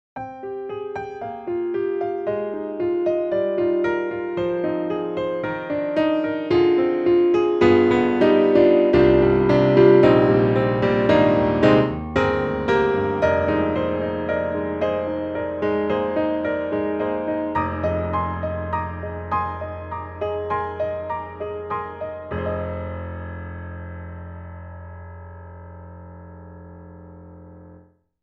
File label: Grand Pianos, German D, German Concert D Grand; Synthogy; IvoryII